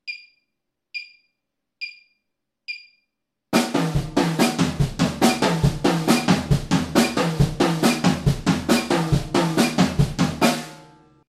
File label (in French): break déplacement toms